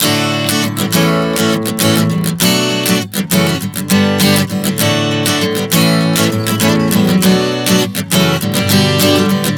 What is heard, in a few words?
D-A-Bm7-F#m7-G 100 bpm, acoustic guitar chord progressions